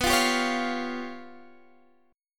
G 7th Flat 5th

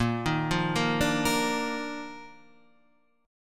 D sharp Major 7th Suspended 2nd A sharp

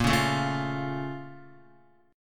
Bb Minor Major 7th, Guitar; x,1,1,2,1,1; strummed; 4F,4A,5C,5G,5C,6E; chord shape

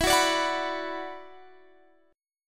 E 7th Suspended 2nd E down Guitar Standard; E7sus2; strummed

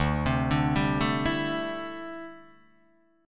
D 9th Suspended 4th D down Guitar DADGAD

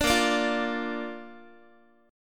Bb Major Bb down Guitar, chord; strummed

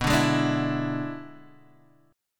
E 7th B, guitar

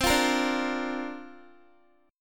C 7th Suspended 2nd Suspended 4th C down Guitar; C7sus2sus4 strummed

